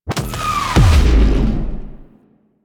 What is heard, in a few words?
Missile Volley; The New War; New War Intro Moon; Railjack